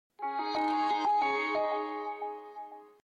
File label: Samsung TV start up